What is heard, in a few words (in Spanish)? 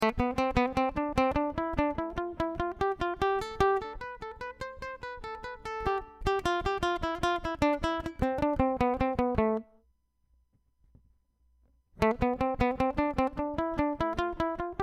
Escala Tresillo Back